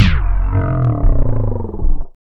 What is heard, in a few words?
T STOP; E-Lab; X Static Goldmine 1